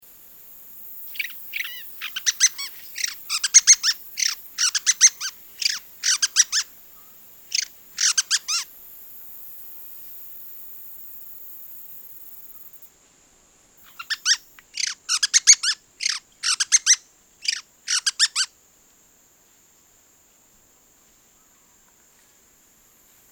monjilla, nonnula frontalis stulta

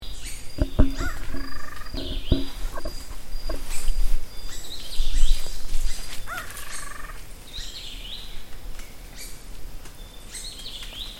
Batará pizarroso occidental Thamnophilus atrinucha

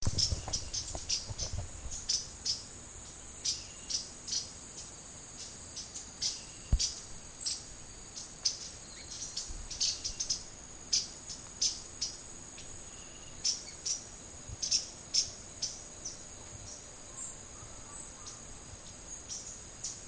tangara carinegruzca mitrospingus cassinii

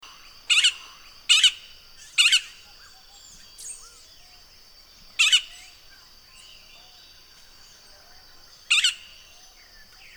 carpintero lindo melanerpes pulcher

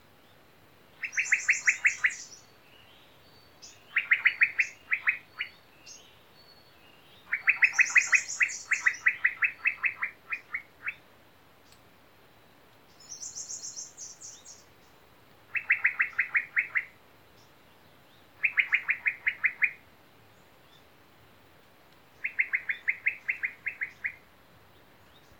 cucarachero bigotudo montano Pheugopedius mystacalis saltuensis

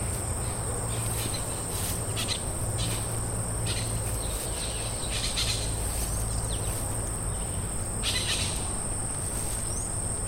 aratinga pertinaz eupsittula pertinax

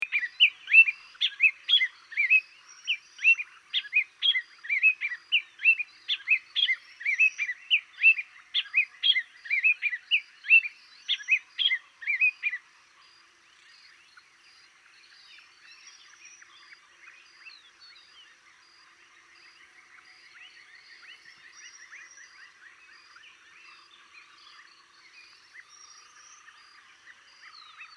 tangara de galones tachyphonus phoenicius

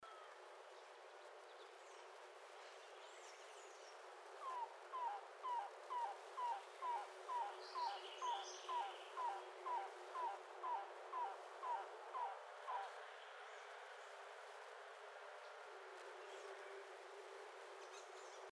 cuclillo enano Coccycua pumila

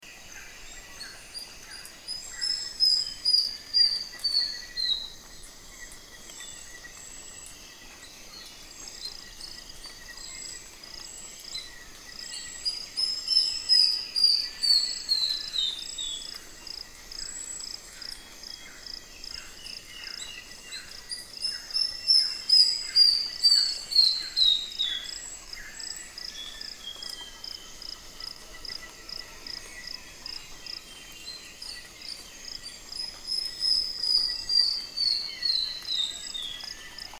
mosquerito canelo neopipo cinnamomea cinnamomea

